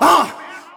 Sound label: huh, Voice